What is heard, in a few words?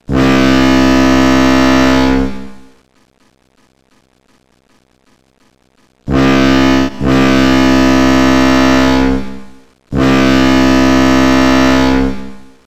Ship Sound